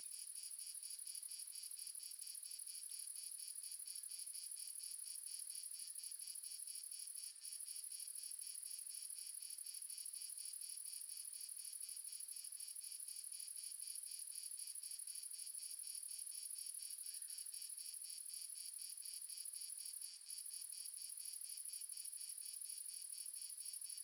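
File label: ambience crickets multiple south france; RedSonic; Crickets and Frogs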